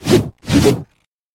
Scythe Combo; criminality

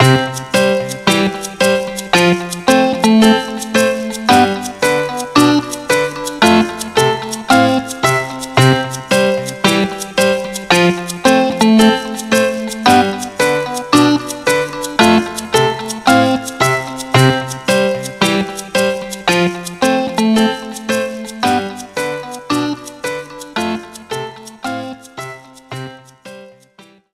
Spelling Music; Story of Noah's Ark; nds

